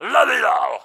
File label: Gunsmith Upgrade